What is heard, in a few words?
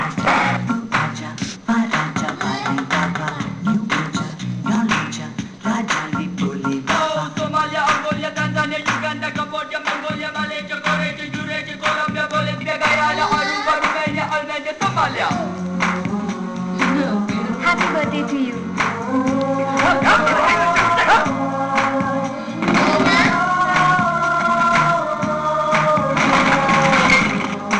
Trailer Song; Ayan